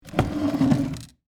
Wood Chair Slide, Drag; Foley; Wood Sound Effects; Wood (Sound Effects:Materials); BlastWave

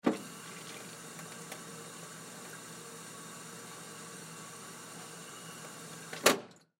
Drinking Fountain, Drinking Fountain Run Short; Dripping; Objects; Blastwave

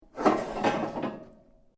metal rods move

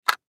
Chalk Box Set Down Cement; Playgrounds; Blastwave; Foley

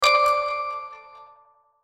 Hit Xylophone Delay High; Musical Mallet Percussion High Production Element Imaging Element Accent Transition; Blastwave

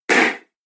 Epic Crash Bang, impact; Miscellaneous Impact Sound Effects; Impact / Explosion Sound Effect

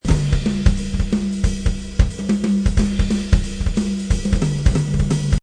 Jazz drum loop at 180 bpm.; Jazz Drum Groove Loops 180 bpm 1

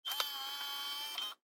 Security Camera Servo, Auto, Focus, Short; Technology; BlastWave

